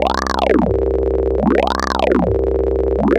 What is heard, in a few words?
Noise, LFO & Noise